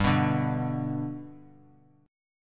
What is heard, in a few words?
G Major down; G strummed; JGuitar